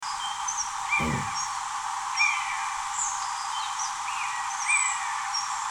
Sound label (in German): mäusebussard